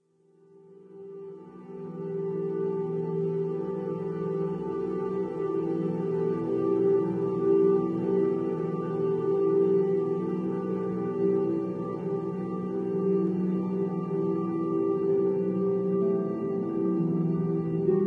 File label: Lament; Taverley; RuneScape 3